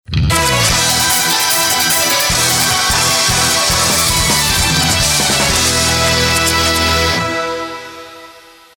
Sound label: versus, music; perfectfighter; flashkof